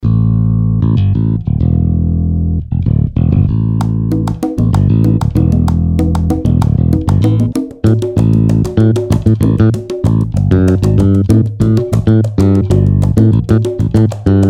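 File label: Persian 6/8 electric bass; Parsiloop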